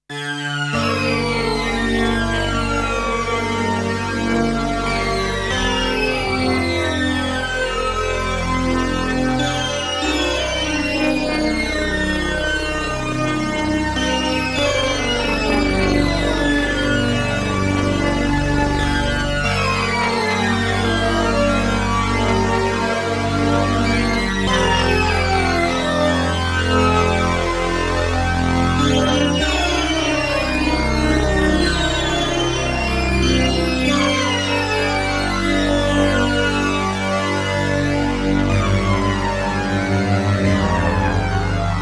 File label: Synthex sync sound, synthesizer